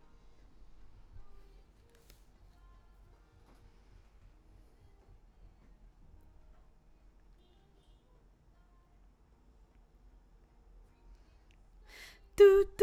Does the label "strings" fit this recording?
No